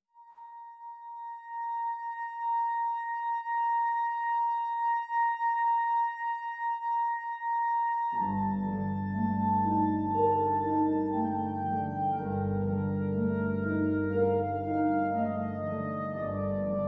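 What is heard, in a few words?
clarinet Harp; solo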